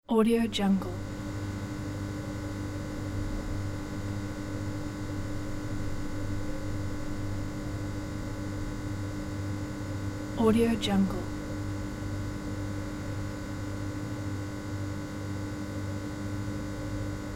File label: neon lamps ambience